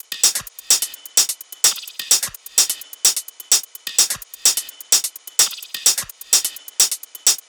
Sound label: tops 128, Drum Sample Pack, Loops, Top Loops; SD SEISMIC; Slate